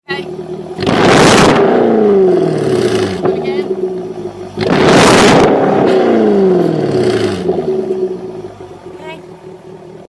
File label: V8 sound stationary; F-Type X152